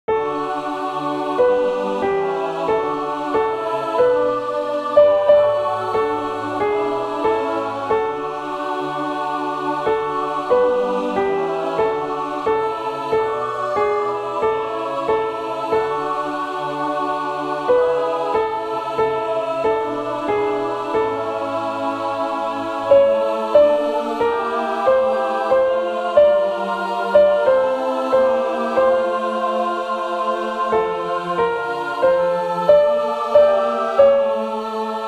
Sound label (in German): Tenor Klavier; Crossing the bar; Alfred,Lord Tennyson